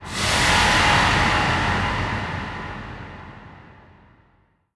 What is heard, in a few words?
shoot wet, strider, combat, warp cannon; creatures